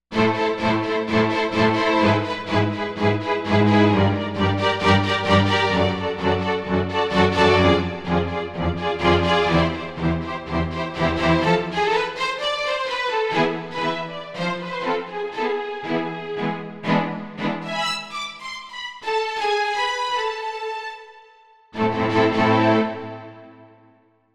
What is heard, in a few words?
Marcato